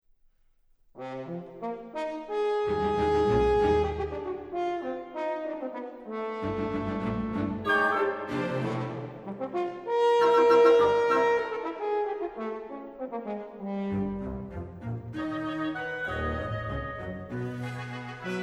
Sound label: Horn; 3 Horn Conc 1st movt